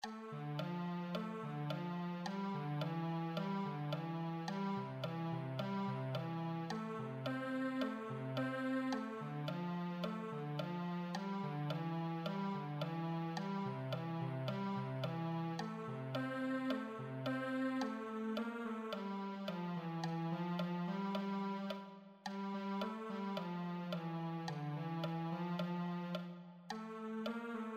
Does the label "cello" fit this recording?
Yes